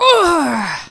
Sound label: pain